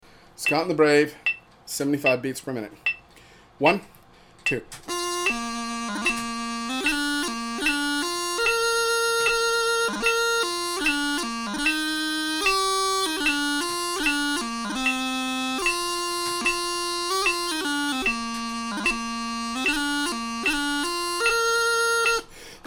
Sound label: 75bpm, bagpiper; Scotland The Brave